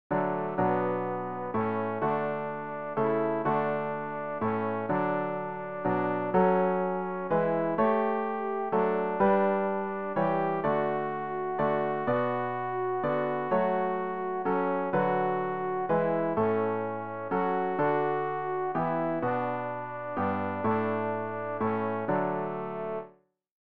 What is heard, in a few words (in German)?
alt, Übehilfen; Ref. Gesangbuch; RG 057: Nun jauchzt dem Herren, alle Welt